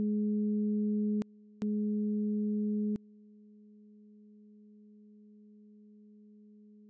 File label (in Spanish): ruido pasillo